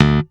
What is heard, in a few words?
D1 SHORT, D-STIK BASS; D-STIK BASS1